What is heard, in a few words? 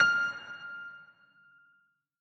dial tone piano; OnePlus One China